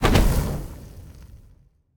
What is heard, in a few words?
cast fire; smite; god merlin